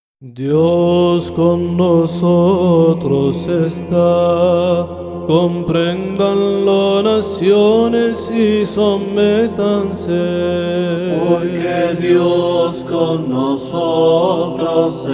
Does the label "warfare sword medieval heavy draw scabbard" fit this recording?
No